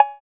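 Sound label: 8000 cowbell; Drums In Your FACE!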